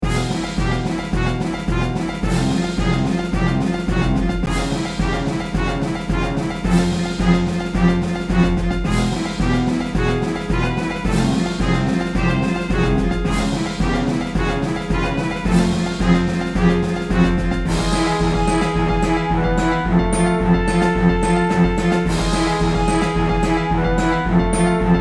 Coronation Invasion (Orchestra); dragon fantasy book i the volumes of westeria

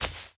warrior walk grass

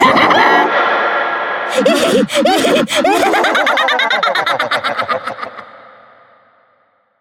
Jump Scare Screaming Face

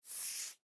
Chisel; Chisel Poem

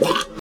bite; punkcroc

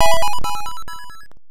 Game Clear

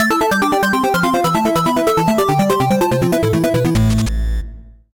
Battle lose, soundtracks; Sam & Max Save the World Remastered

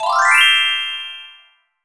Jingle New Drop Rare; Puzzle & Dragons Gold

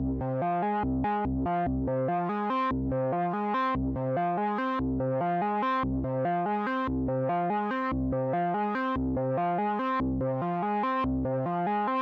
Combat Synth Stem; Necropolis

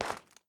dirt concrete footstep

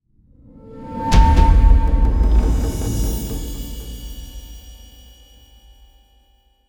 environment music stinger; level 01; Aragami

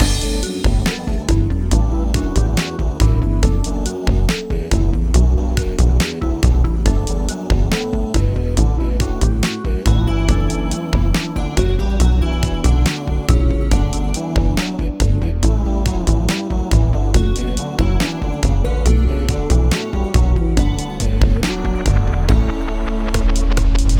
Drowning (Game Over)

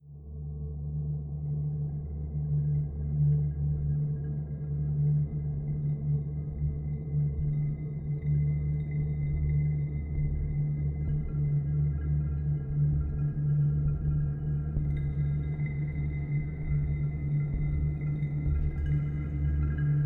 Court Games Ambient; Toussaint

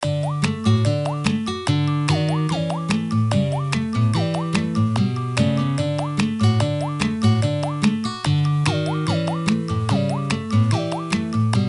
Main Music; Spanisch Buddy; NDS

